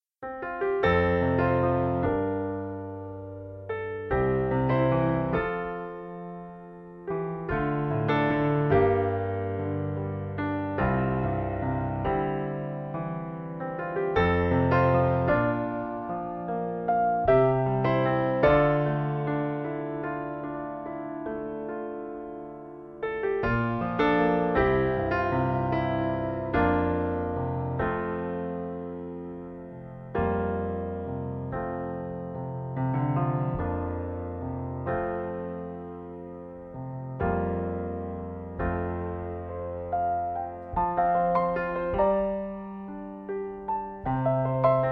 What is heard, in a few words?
piano